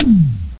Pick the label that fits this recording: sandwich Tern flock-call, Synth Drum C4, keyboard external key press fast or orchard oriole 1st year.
Synth Drum C4